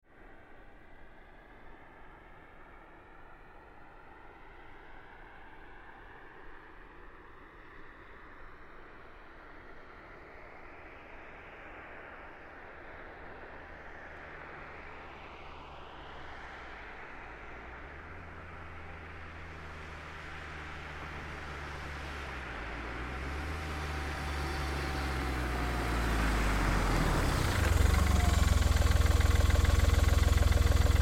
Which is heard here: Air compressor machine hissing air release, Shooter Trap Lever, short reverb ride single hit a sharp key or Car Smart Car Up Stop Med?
Car Smart Car Up Stop Med